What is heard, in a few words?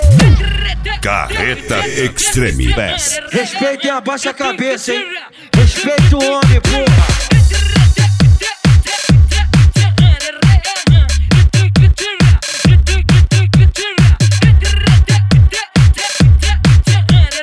extreme bass; carreta